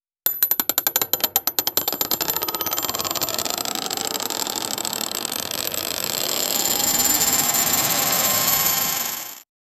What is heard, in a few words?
cinematic spinning wheel, game show